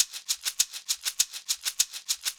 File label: JUNKYARD RHYTHMS